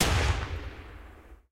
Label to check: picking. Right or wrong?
Wrong